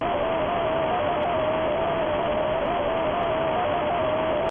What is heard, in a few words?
jumpscare sound; one night at springtrap 3